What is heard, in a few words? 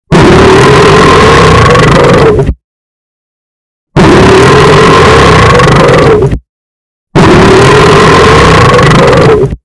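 waterfall sounds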